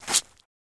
Grapefruit Being Sliced By A Knife

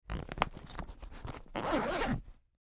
Zipper Close; zippers

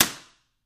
Belt Hit Slap Flat

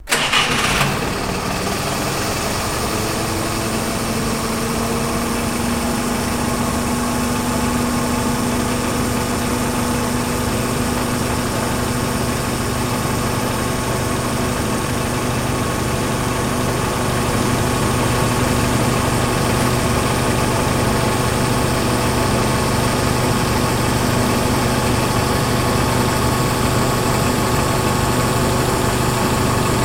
Forklift Start Idle Off, Mic In Front

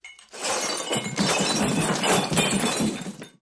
Debris Crash Crashes

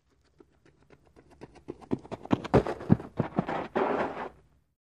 Basball FS Mvmnt Sld, baseball; baseball fx & games

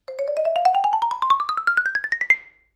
Xylophone Lng Ascend, mallets xylophone

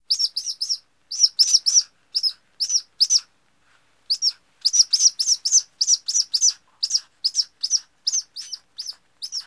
Hawk Squeaks Multipl

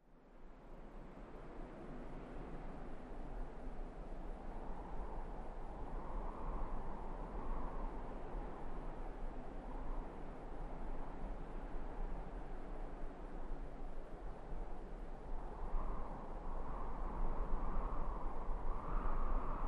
Desert Wind Medium, sandy desert & gusts

